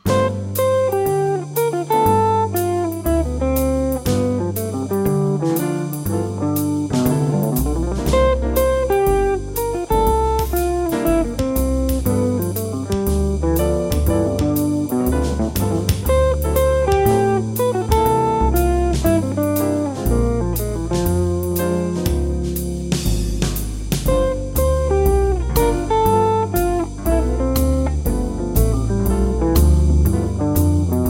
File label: gutsi blues